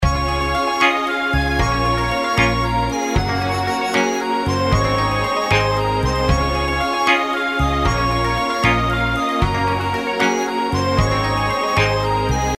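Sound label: outro background music, golden shanghai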